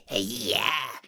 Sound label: monster taunt; spiritheroes